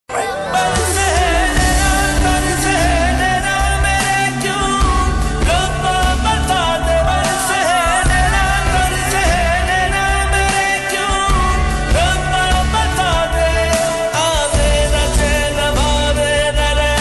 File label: male version ringtone